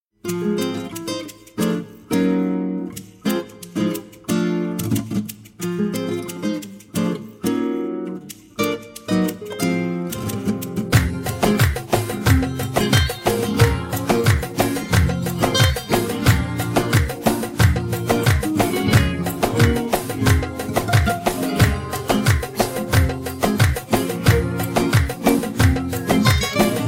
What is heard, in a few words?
Guitar